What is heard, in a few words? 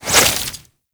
Flechette Reload; Weapons; Warframe; Tenno; Oraxia